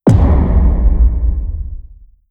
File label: kinectic artillery hit; weapons; Stellaris